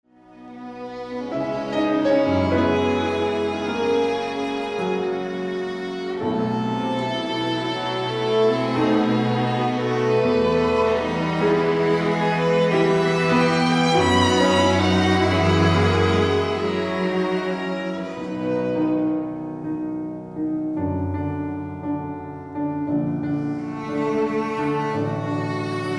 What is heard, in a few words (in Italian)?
Quintetto per pianoforte, due violini, viola e violoncello Andante; Alessandro Longo